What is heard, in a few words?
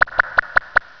DROP